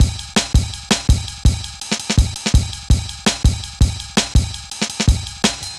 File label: Jazz Ride, Drum Loops, 165.5; Zero-G Jungle Warfare 1, 2 & 3; Jazz Ride B; 90 s Sample CDs